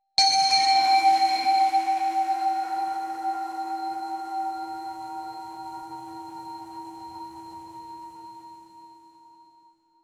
a classic 16 bit hit soun

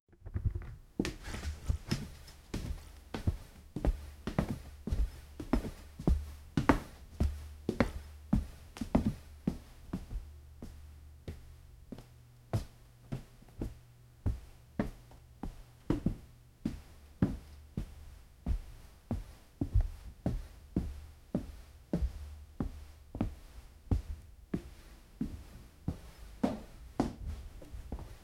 adjusting my appearance i, mirror

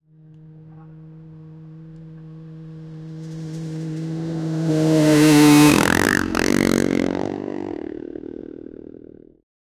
high speed motorcycle cra; crash

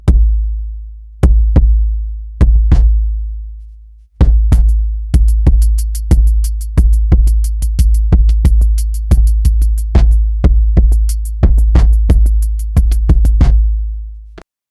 indigenous hand drum, ceremonial

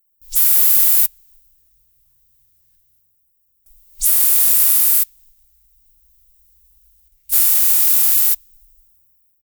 close up perfume spray so

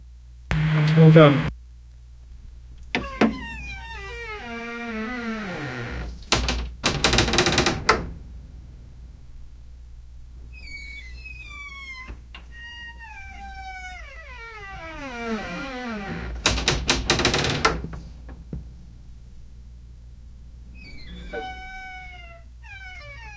loud doors banging and creaking

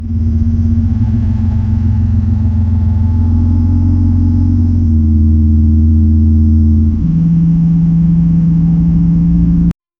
flying car engine sound

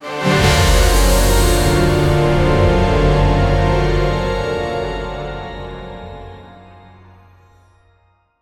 epic cinematic orchestral, shimmering particles

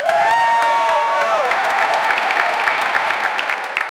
fans clapping proud and, applause

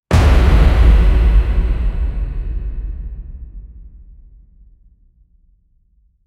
player death fx

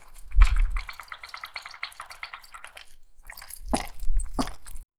dog pooping into a toilet; bathroom

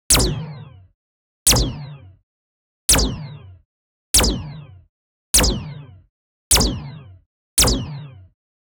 shoot arrow mmorpg sound

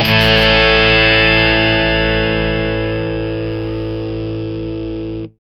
a strong hit of an, electric guitar

